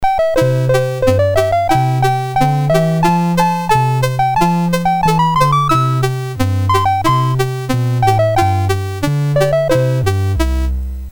Dixie, MOS6581R3; Jim Butterfield

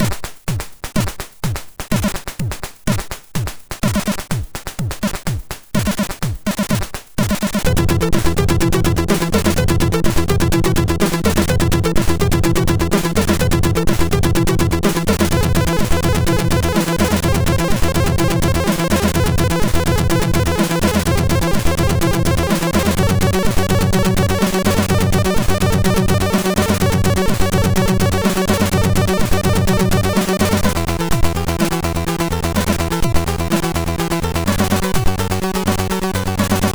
Short Techno sid MOS6581

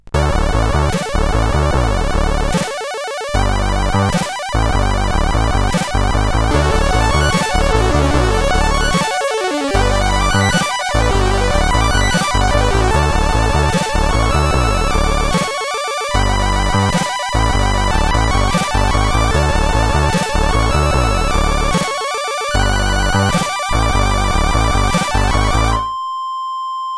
Lame Tune, sid CSG8580R5

